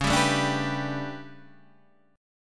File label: A 11th Db, guitar chord